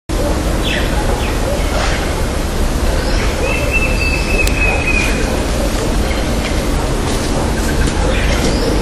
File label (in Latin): Pyriglena leucoptera; PP Araucaria; Jorge La Grotteria